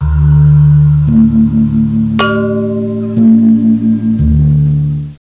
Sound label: gong sound